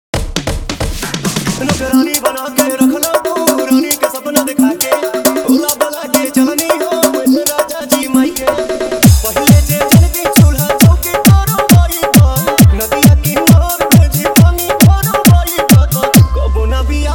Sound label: Bhojpuri Song Hard Dholki Remix